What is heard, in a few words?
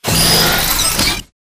Drone Deploy Activate Extend; Titanfall 2